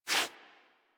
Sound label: Foley Idle Paw Swipe Quick; Enemies; Kubrow; Warframe